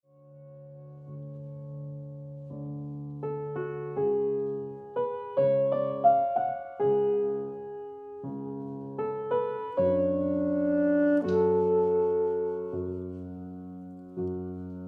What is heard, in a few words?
Souffle Sax, musique